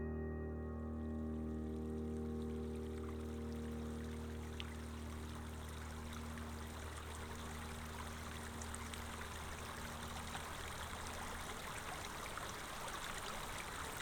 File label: nature soundscape